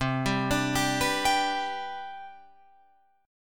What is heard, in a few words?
C Major 7th Suspended 2nd C down Guitar Open F, chord; CM7sus2 arpeggiated; JGuitar